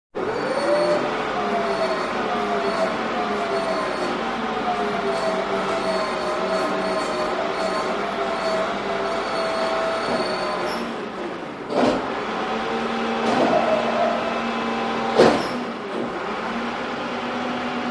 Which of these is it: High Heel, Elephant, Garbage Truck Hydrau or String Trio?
Garbage Truck Hydrau